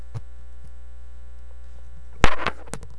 quack

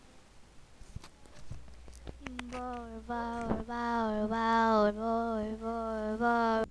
bowl

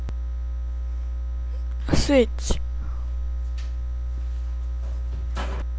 switch

